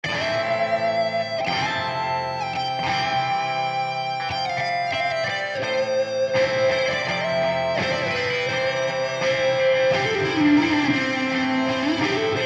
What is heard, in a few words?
guitar bias